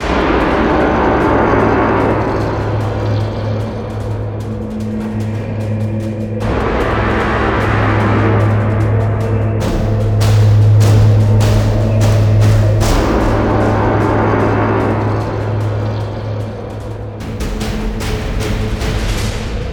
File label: Base Loop low